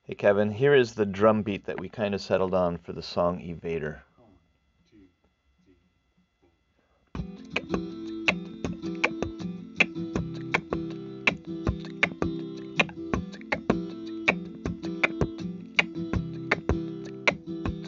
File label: beat